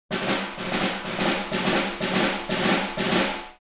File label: Cartoon march